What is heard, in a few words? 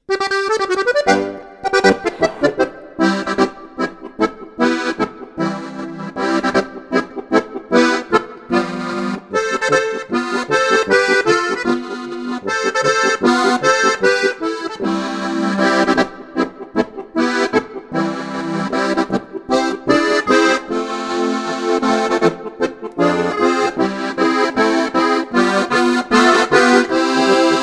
Polka